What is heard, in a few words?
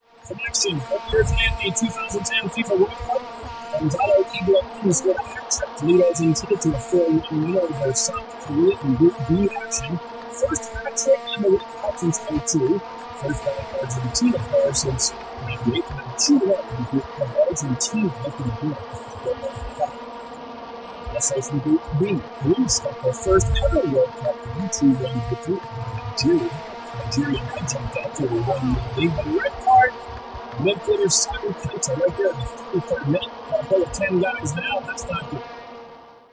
vuvuzela denoise extreme